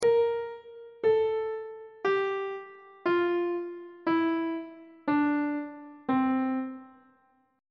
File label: c mixolydian mode descending on treble clef